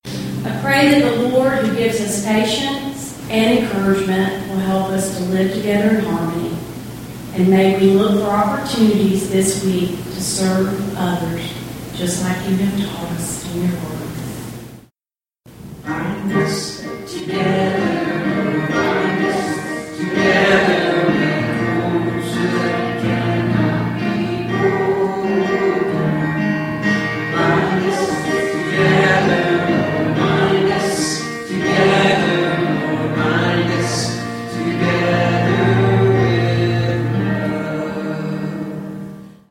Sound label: Benediction and Closing Chorus